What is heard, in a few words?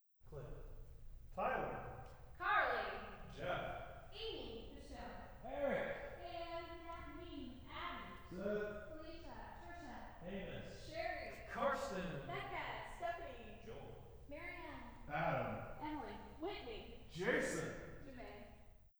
Choir Test E; kimber kable Isomike Tests 2005b